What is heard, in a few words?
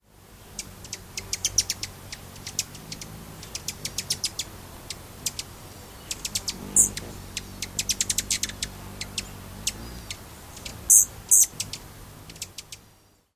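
chips & trills, Rufous Hummingbird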